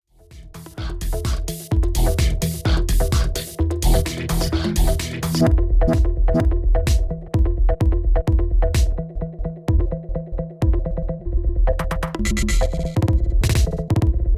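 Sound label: Denon DJ X1850 Ping Pong Frequenz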